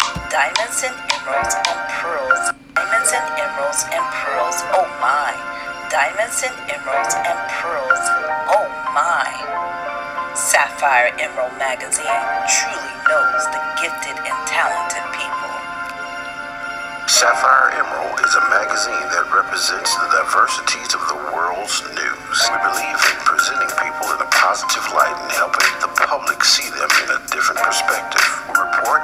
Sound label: Lead Vocals